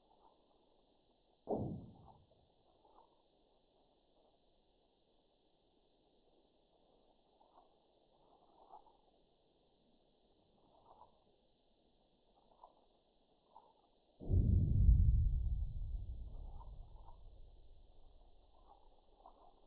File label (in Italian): piedimonte matese, Sonificazioni Eventi Sismici; Piedimonte Matese Magnitudo 4.9 Profondita 10.5 Km 29/12/2013